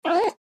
Stressed cat wail; animal

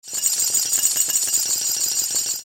Metallic chain pulling rattling

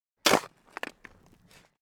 Shovel dig garden bed impact

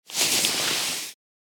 Nylon rustle movement

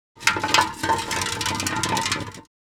Empty paint can rolling on the ground

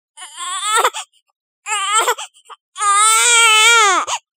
1 year baby crying

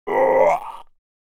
Adult male retching deep groans; Puke and Vomit; Vomiting; gfxsounds

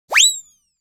Cartoon slide whistle up

